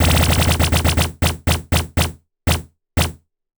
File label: consecutive impact, mahjong; ボードゲームやカジノ、麻雀の効果音です